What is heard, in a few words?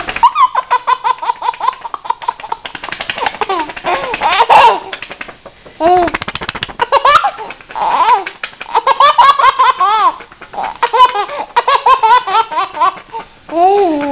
baby